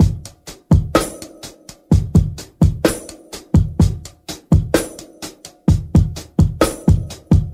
bumping along, Boombap, Drum loops

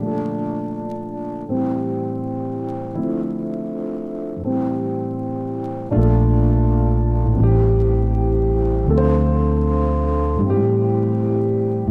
keys

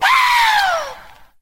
woo scratch; Voice; ooh ahh

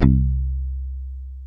muted, Guitar; Notes

